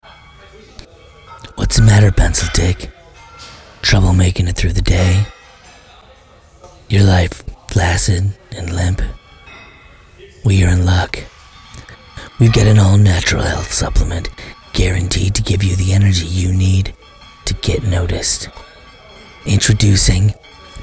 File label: Commercial